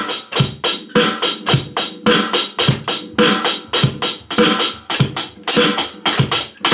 polished live drum

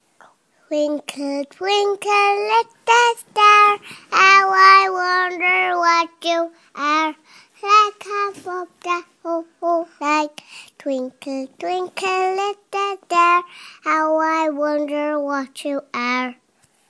twinkle twinkle, I'm only 2

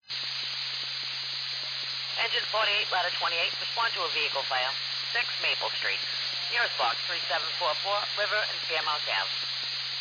page received

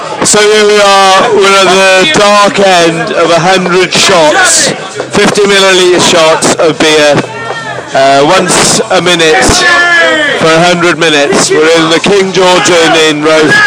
wretchy sound effects; lumberturion carnage

